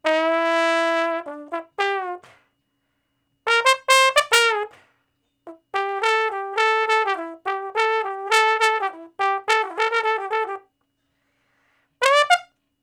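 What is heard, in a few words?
Trombone Slow Blues (G I, IV, I, I); Crimson Blue and Fabulous Horncraft 4 RnB; Horncraft for R&B; Solos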